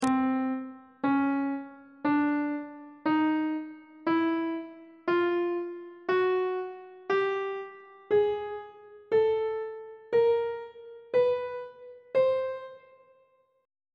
c mixolydian mode chromatic scale 1 octave on treble clef